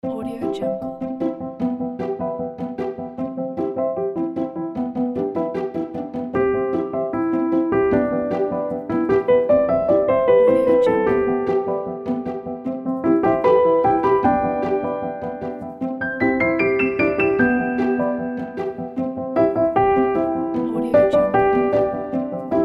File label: positive playful classic